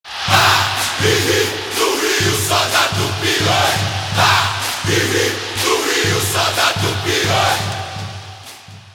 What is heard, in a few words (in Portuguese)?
VINHETA; SO DA TUPI